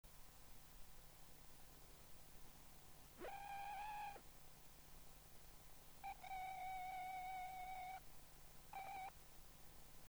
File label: tape; ビキーノイズ